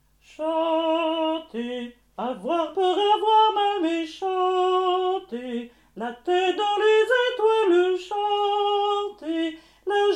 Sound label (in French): chanter hommes